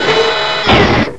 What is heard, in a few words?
door; sven; caged